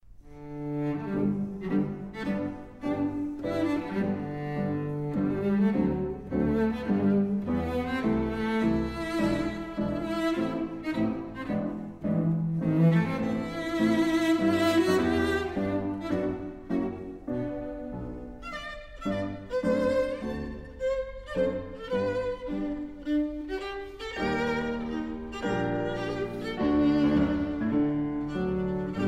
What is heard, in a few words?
piano trio; pixis